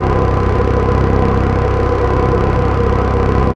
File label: ship engines; World Of Warships